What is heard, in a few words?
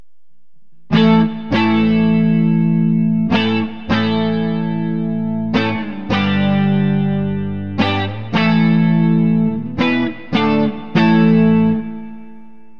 evolution neck clean, burny fugly clips